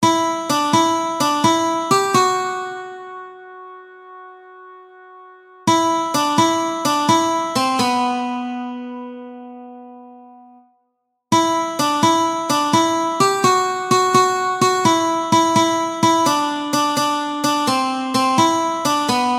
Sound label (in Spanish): Guitarra